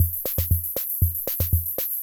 Loop, classic machines, rhythm77

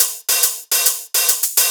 Shuffled Loops, 140 BPM; Vengeance - Essential Clubsounds Vol.4

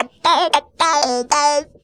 Funky Vocals; USB Soundscan vol.18; AKAI

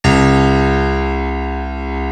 JD piano

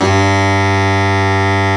BARITON SAX

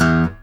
ACSPOP, Guitar & Bass; Quantum Leap

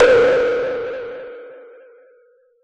industrial kit fx

